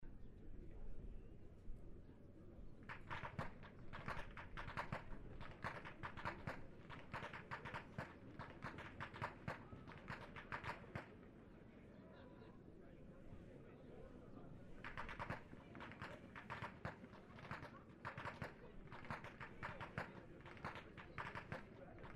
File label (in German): schnalzen, jugend; waging